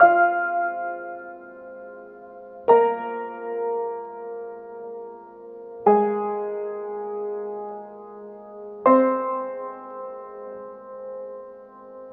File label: paino, Sad